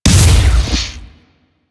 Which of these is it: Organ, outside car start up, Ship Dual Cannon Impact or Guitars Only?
Ship Dual Cannon Impact